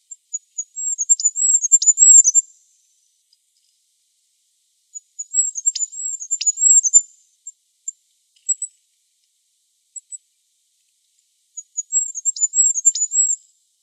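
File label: korolek, goldcrest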